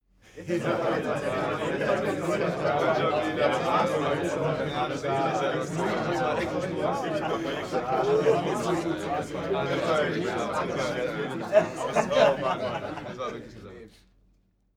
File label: male crowd happy